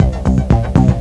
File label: 6 bar c-a#-c; Swoop Music